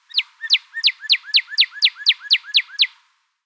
trop bird

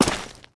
gravel, footsteps

